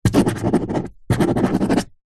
office pen ball point write signature paper on plastic table